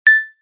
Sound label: nokia beep once; ringtones; sms